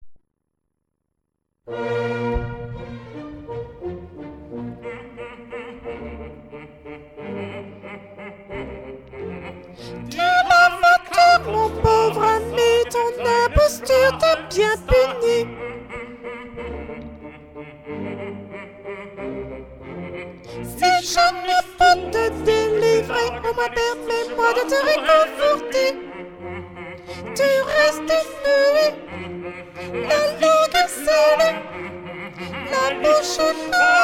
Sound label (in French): flute; FAURE BENEDICTUS